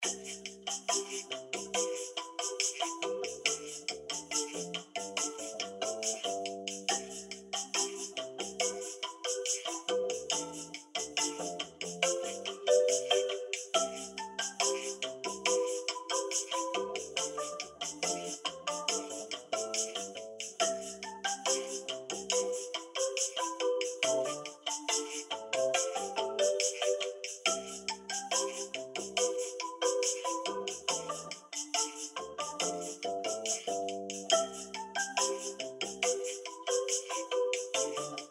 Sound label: Elevator; virtual virtual reality ost